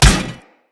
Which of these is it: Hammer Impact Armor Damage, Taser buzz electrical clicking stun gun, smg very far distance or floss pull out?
Hammer Impact Armor Damage